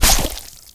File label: hit